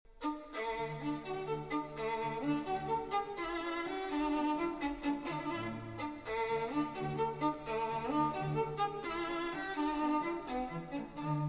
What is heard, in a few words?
Violin Concerto in D Major; Op. 61; Beethoven; III. Rondo.